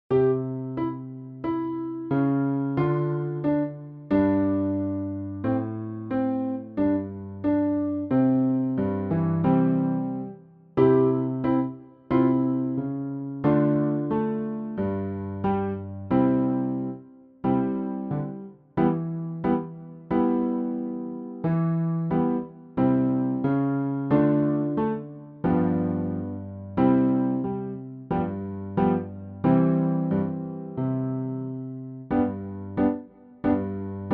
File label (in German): Clarineo Haenschen klein Playback